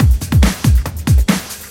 Loops BB 140, BreakBeats; Vengeance Samples

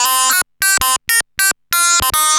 Arp E Line, ARP Odyssey; musicradar-synth-samples

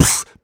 Beatbox Snare, Human Beatbox

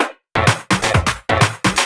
Electro Loop 128 BPM; Vengeance Samples

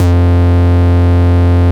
Root F, Bass

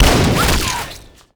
FX Impact; Vengeance Samples

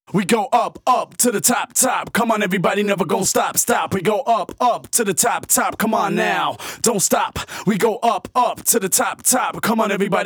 Rap Vocals Chorus DRY, 128 BPM; Vengeance Samples; VTDS2 Song Kits; Rap To The Top

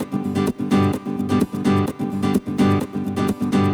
Nylon Guitar G maj, 128BPM; Vengeance Samples; VEH3 Nylon Guitar Kit 2

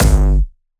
Jumpstyle Kick G1, vengeance essential bassdrums; Jumpstyle Multis; vengeance essential club sounds-3; VEC3 Bassdrums

